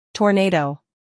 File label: tornado